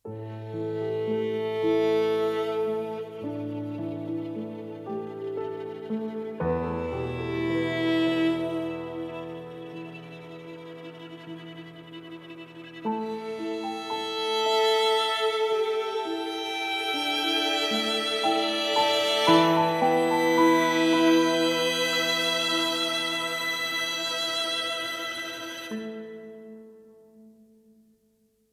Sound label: violin low tide